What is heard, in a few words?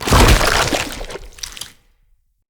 Head Explode; Foley; Corrupted; Corrupted Bromius; Animals